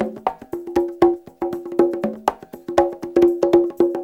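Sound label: CONGA LOOP, Techno Trance; E-MU Formula 4000 Series Vol. 2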